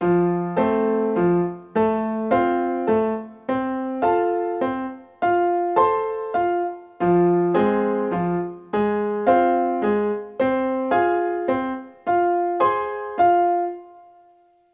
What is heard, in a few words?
triad and inversion next rhythm 1 in F and Fm